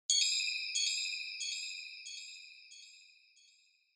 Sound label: Single Accent Multi Delay